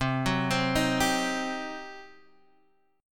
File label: G Major 7th Suspended 4th Sharp 5th C